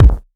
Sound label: gross kick